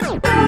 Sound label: E VOX STAB; funky; Loopmasters; Funky Underground Vol 2